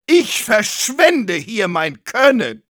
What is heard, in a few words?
medic jeers, de; "Ich verschwende hier mein Können!"